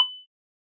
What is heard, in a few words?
GLASS